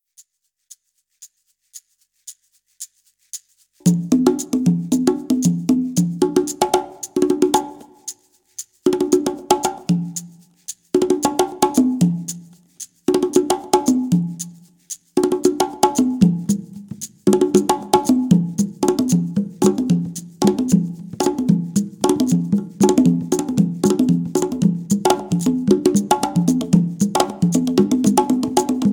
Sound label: Congassa AFRO PERCUSSION BAND